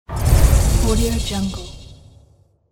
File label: magical spell sound